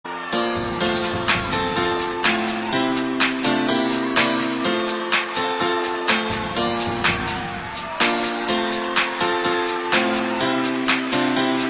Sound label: auld lang syne Ringtone; Nokia 3310